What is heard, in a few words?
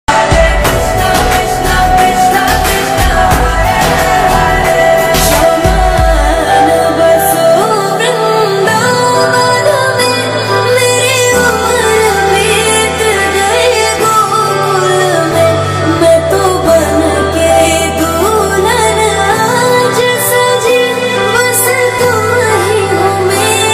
krishna song mobile ringtone music; hare krishna